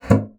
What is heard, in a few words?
bassoon slap; Xsample VOL-6; C BASSOON